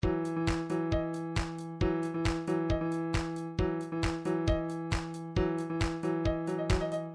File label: trance midi loops beats